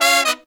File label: Horns 110BPM; Zero-G; Phantom Horns